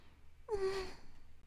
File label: Moan